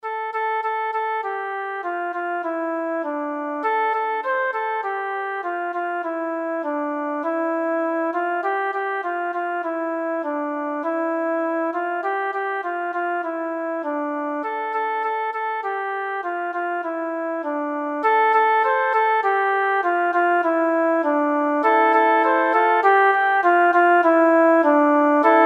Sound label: Balalaika